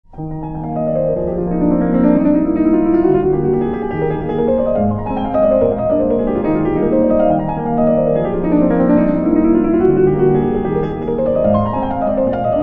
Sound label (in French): Impromptu pour piano; SCHUBERT (Franz); D899 Op90